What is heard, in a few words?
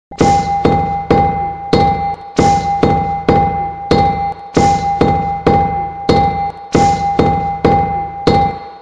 Chanting